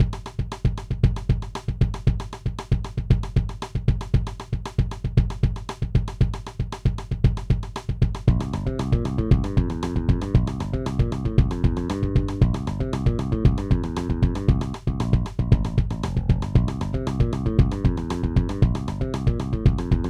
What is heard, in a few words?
bass beat